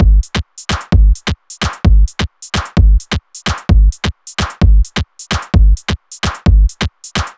Kick her low, Digital Breaks, 130BPM; loops; Loopmasters; Jon Carter & Alex Blanco; Block Party Essentials

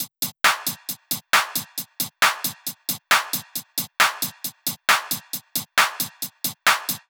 135 DRUMLOOP; Loopmasters; Booty Breaks Vol.2

